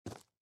SFX run rock

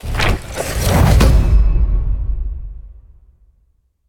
Book Upgrade Completed

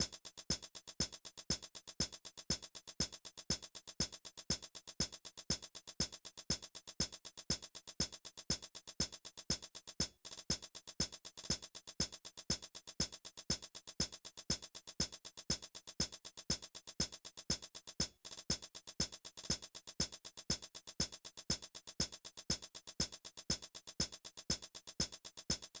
Main Music Hihats; Plants vs. Zombies

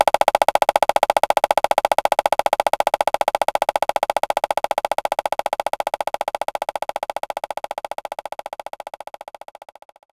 Conga High Muted; knights try

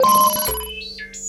code puzzle ok

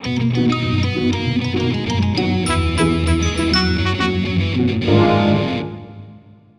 Bari Sax

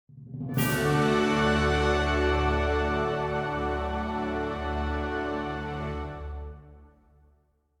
Fanfare 85bpm

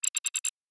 Error

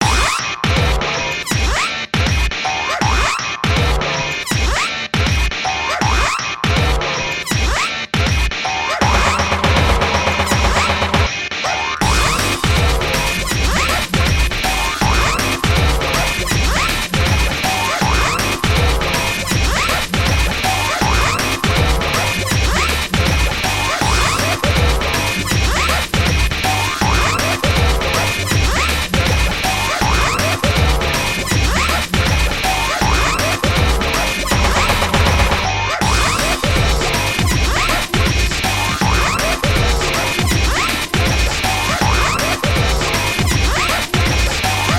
sea battle middle boss normal

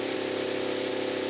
Engine; Buggy; PS1

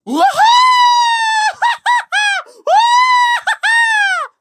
Roar; Collector

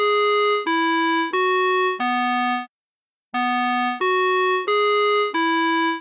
Clock; Five Nights at Candys

